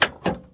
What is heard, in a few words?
Hood Open; car simulator 2